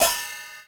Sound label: Chappa Cymbal; Puchicon; dsi ds